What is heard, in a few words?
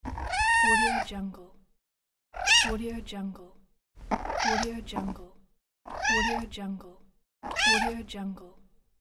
kitten meow; n-audio